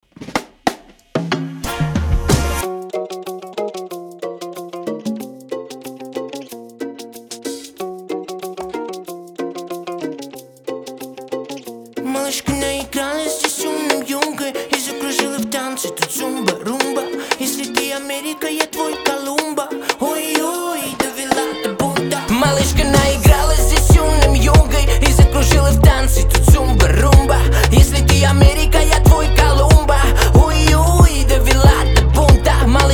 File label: Zumba Rumba